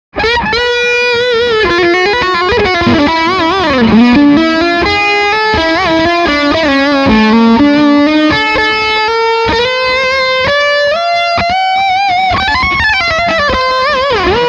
Leadsound Steg PU

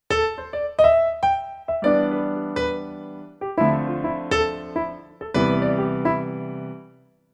Bright Piano mit Brilliance

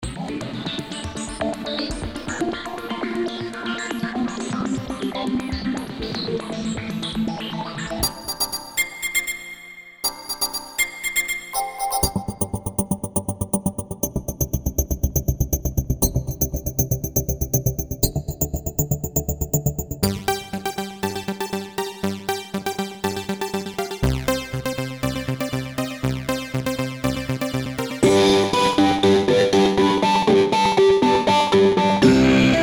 Einzelsounds Harmony

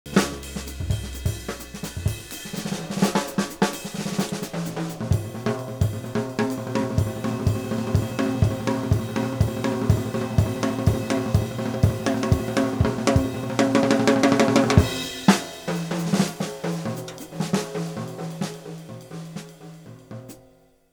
Jazz Drum Solo trocken

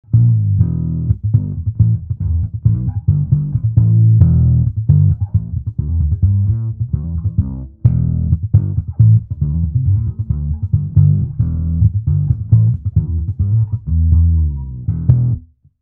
Thump Mikrofoniert; Godin

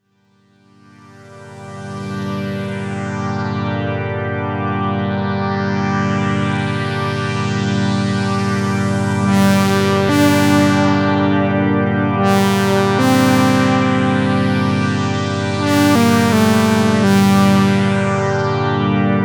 Orgel Pad; Nord Stage

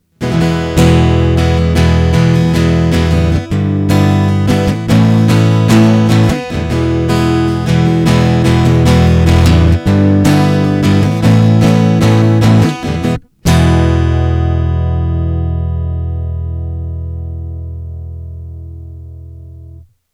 Harley Benton TravelMate E Pickup Strumming Volume runter geregelt

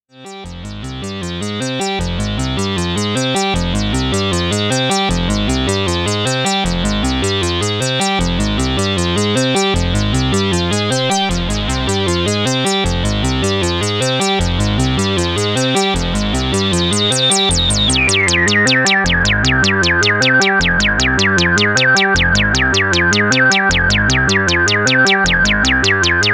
Arpeggiator Unisono mit X Mod

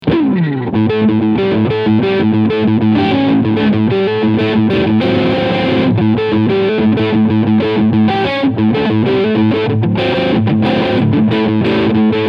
Crunch beide

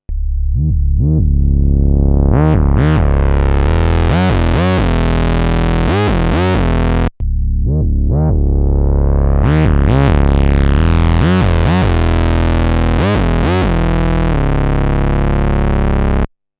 Alt vs. Neu Bass Station

